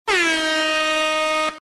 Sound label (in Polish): syrena sygnal